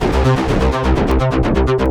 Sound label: C2, SynthLine; 90 s Sample CDs; NiceBeats; SynthLine VOL-20